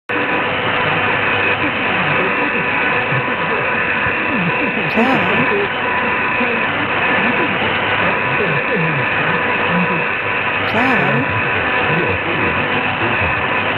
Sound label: DRM mandarin